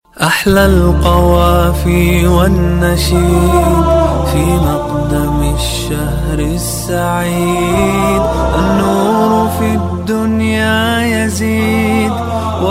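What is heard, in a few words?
ramadan song ringtone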